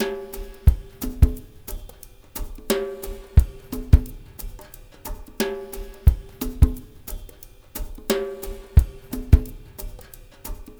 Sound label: MIX, SWINGTOMS; Spectrasonics; Liquid Grooves 1 & 2